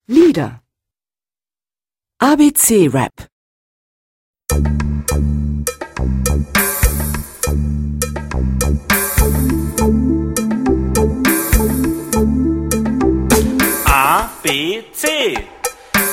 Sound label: ABC Rap